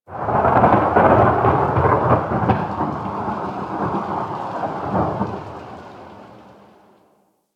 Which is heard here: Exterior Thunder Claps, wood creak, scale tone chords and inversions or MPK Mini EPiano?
Exterior Thunder Claps